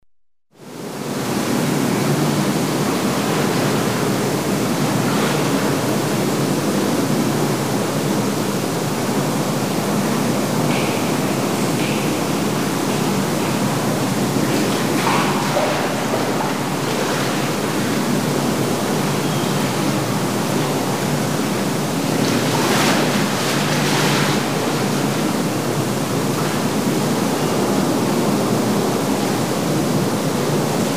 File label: water